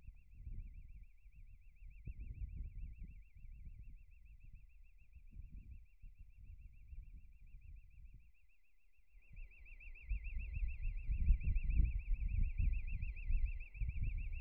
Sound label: Terminal